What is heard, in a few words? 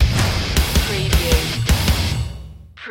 firestorm stinger